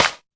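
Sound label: classic hip hop Clap Snare; STYLE KITS (Hip Hop, Etc); Classic Hip Hop Mega Kit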